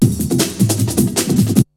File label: BREAKBEATS, TECHNO